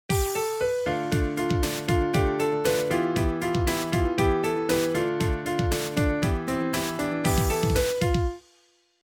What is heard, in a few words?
hookpad thing